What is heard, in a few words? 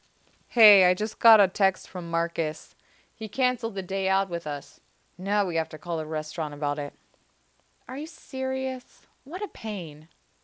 pain